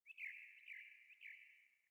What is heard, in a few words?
sting songbirds; Rust